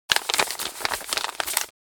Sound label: Thin paper handling